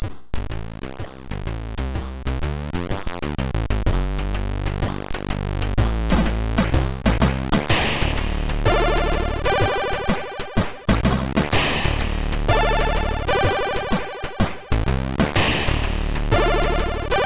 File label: Drunk Funk, MOS6581R3